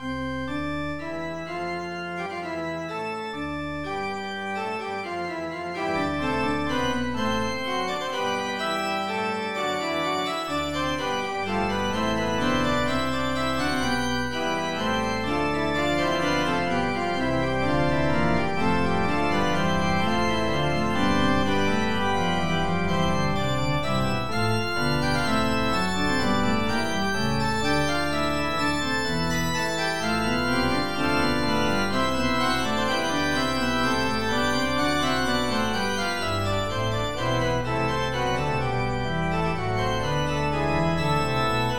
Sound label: fugue